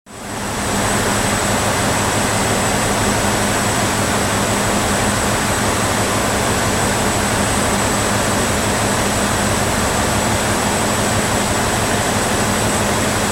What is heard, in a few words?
Ship Large Car Ferry Engine Room; Tom Hutchings